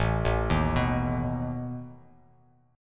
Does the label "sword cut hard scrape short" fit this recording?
No